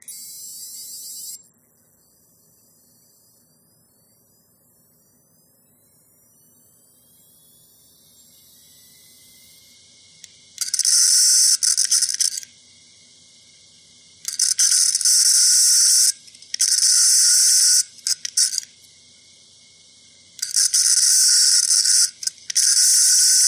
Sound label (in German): Samsung Festplatte kratzende schleifende Geräusche